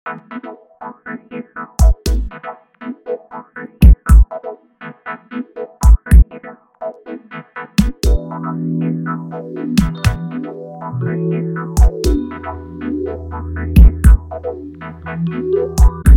Phaser; Tracktion

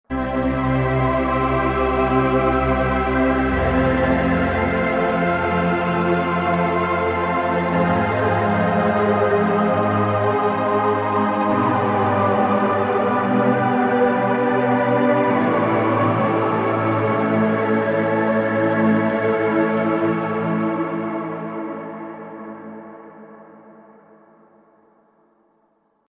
Cypher2 ST Galactic String Ensemble

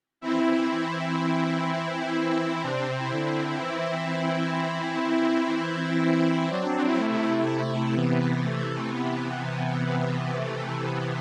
Strings VC340

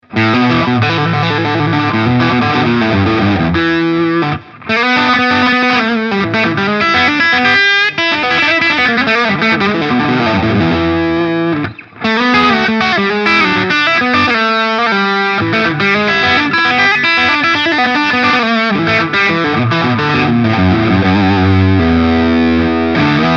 driv in gain full gain lead steg

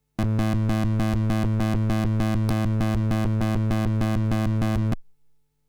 Moog steel nickel vintage; Warm Audio TB12

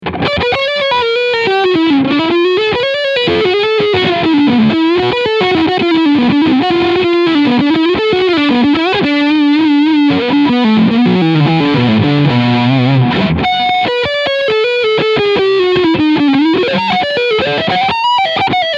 Chapman ML1 RS Leadsound Front Humbucker; Rob Scallon

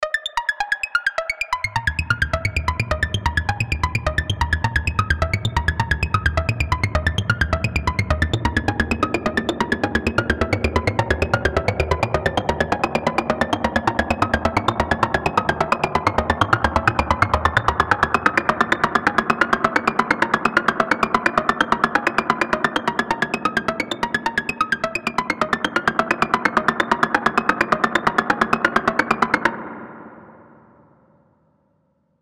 Buchla Easel V Timbre Mod LPG Sequence; Arturia

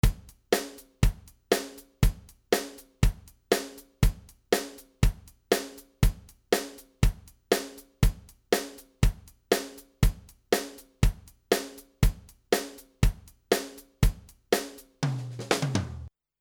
SD1000 Pop

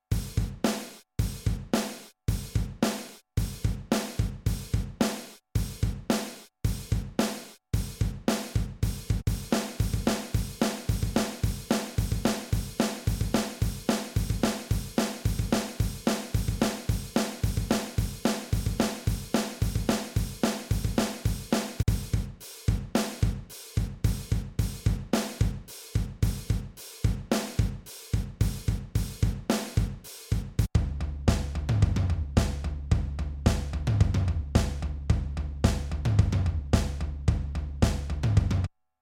Drum Samples; Zoom

